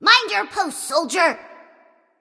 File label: ward placed; smite; voicepack; ratatoskr